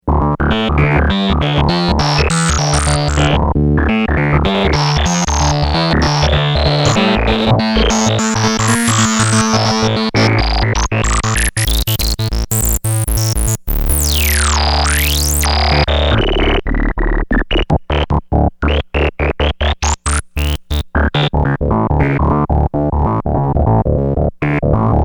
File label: Osc FM Filter